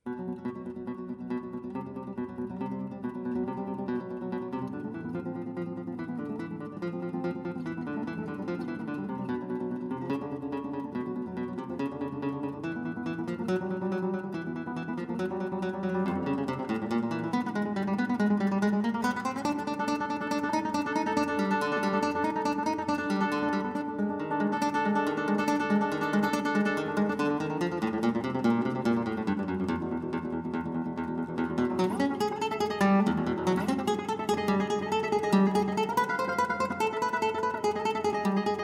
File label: Toccata